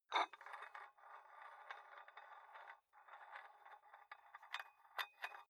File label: dumbbell open nut thread, fitnessstudio